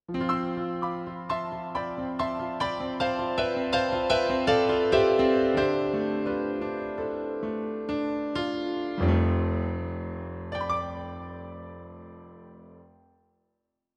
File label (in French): tyros3 sound piano cool, yamaha